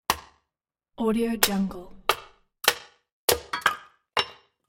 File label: impacts axe wood chopping; blazznet